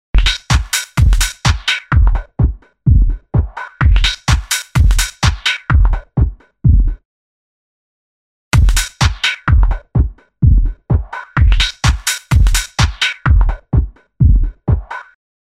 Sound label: Audio Input Loop